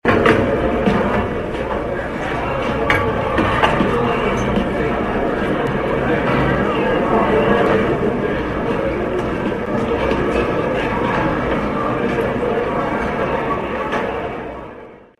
Ambiance cantine; bruitage

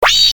Robot

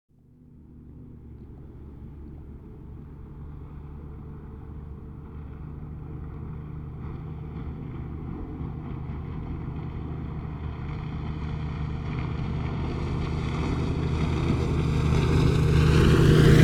Bateau moteur

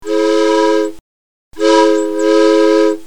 Tchou tchou, Transports

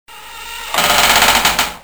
Grincement de porte

